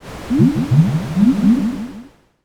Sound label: poison